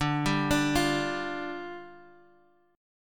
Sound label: D-Minor Double Flat 5th, D; x,5,5,7,6,x; arpeggiated